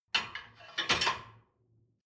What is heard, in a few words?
dishwasher plate place